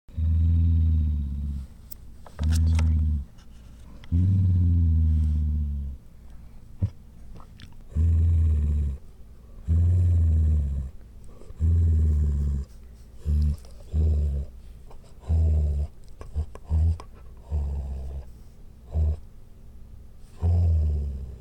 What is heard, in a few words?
Low Growling